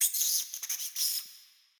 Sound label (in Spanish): Ambiente galerias rata; Yesterday Origins